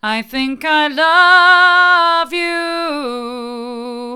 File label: i think i love you hard, vocal